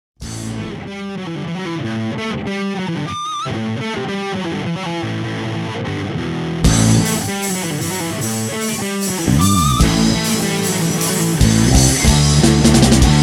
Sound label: rhytmic acid